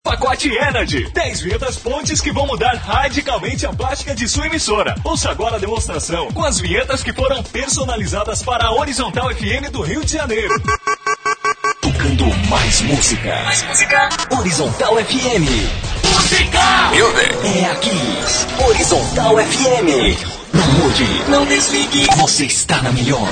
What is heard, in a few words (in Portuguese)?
vinhetas energy